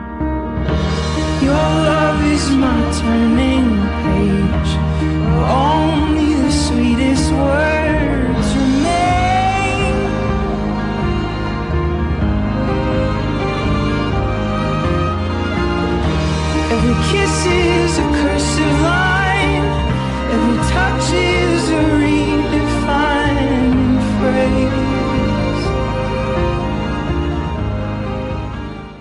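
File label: turning page instrumental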